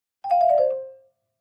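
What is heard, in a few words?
Clavichord Short Major; keyboards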